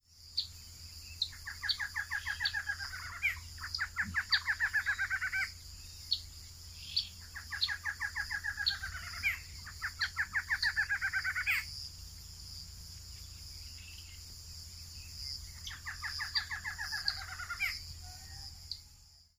Thamnophilus doliatus; Choca Listada

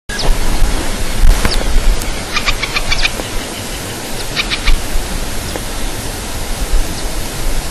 Gallinago paraguaiae; Ruta 40 Catamarca

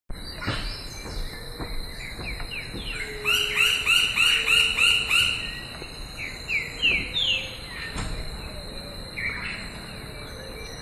Dryocopus galeatus; Karadya; Jorge La Grotteria

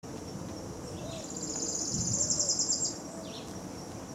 pitiayumi, Tropical Parula (Setophaga pitiayumi)

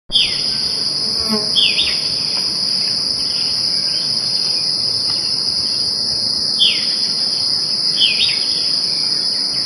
Lathrotriccus euleri; Garabi; Jorge La Grotteria

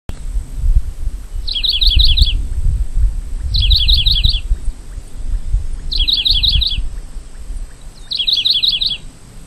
Saltatricula tricolor; Perdices; Jorge La Grotteria

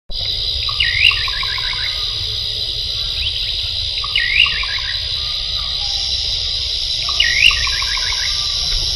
Setopagis parvulus; PN PreDelta

